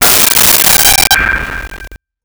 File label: Metal Ting